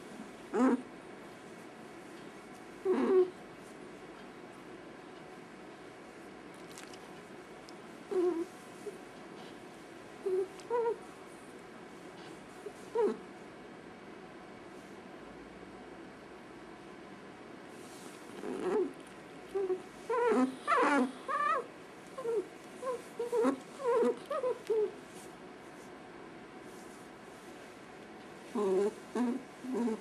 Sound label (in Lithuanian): bendravimas garsas, ferret